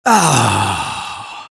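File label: engineer guzzle; vo; taunts; tf2; engy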